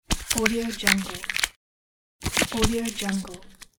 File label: visiting card crinkling sounds